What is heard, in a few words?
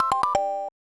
Objectives Menu Open; bs zelda; snes